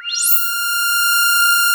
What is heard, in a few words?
SWP ANALOG PAD; PADS; SYNTH FX